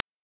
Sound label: wall detector; DeadFright